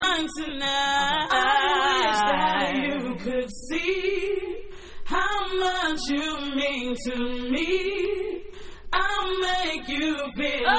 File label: Acapella